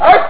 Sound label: bark